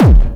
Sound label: Distortion Kicks, Bassdrums